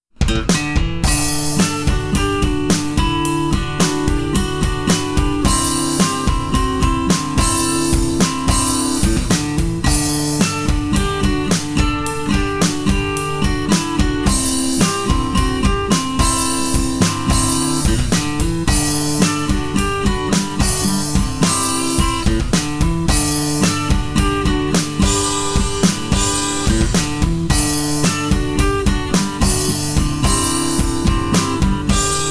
acoustic guitar; music smorgasbord

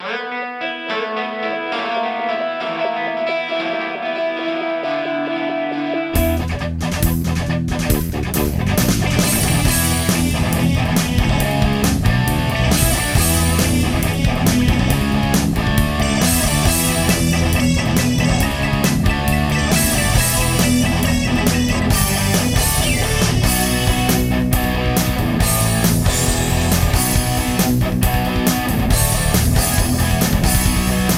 karaoke; music smorgasbord

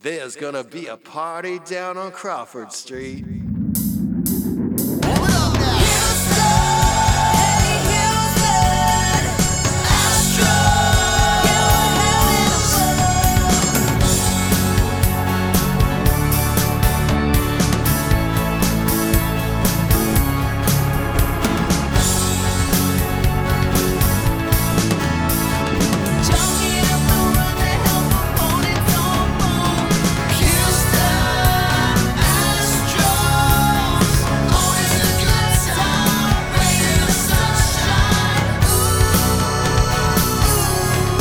acoustic karaoke full mix

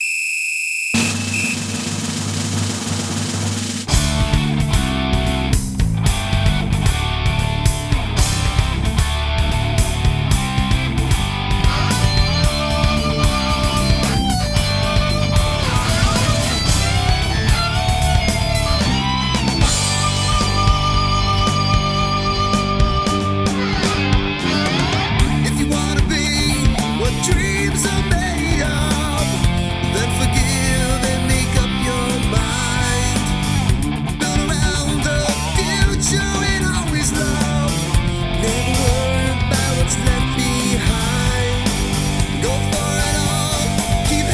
electric harmony karaoke

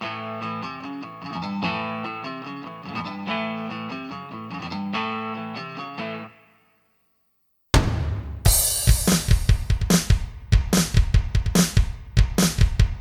male keyboards bass; music smorgasbord

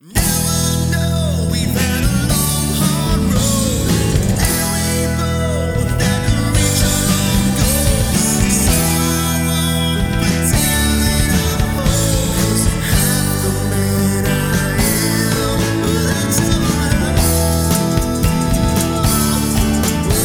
karaoke full mix

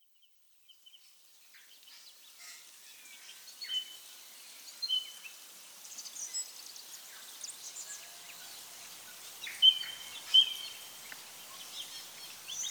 ambiance organ

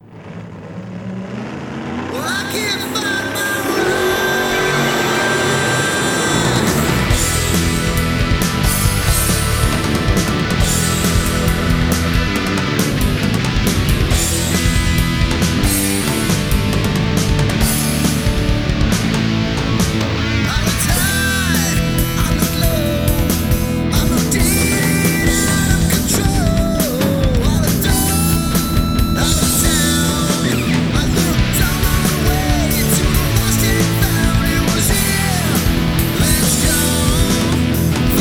karaoke full mix

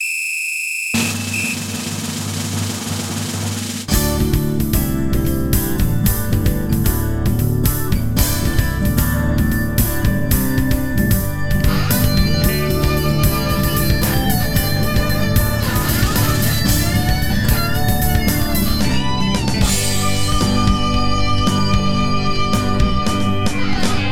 acoustic harmony karaoke full mix

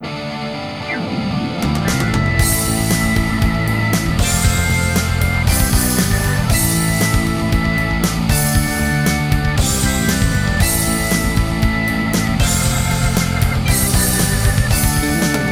karaoke full mix

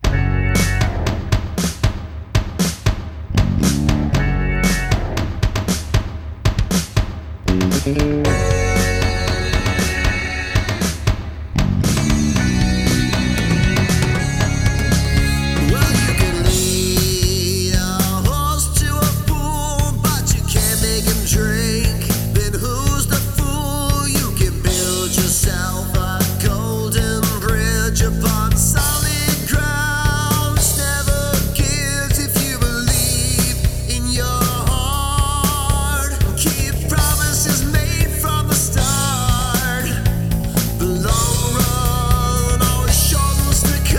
male keyboards bass; music smorgasbord

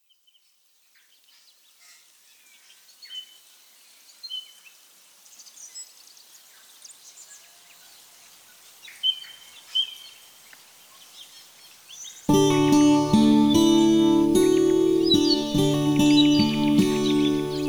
ambiance keyboards

